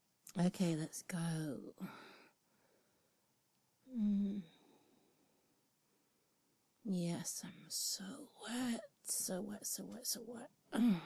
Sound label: Whimpers Lady sounds; F4A; Magic Wand; New Glass G Spot Wand; 30 minutes of ridiculous sounds